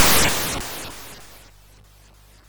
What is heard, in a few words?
Frantic, MOS6581R4